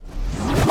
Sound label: charge Lancer; Mindustry